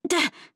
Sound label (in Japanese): 攻撃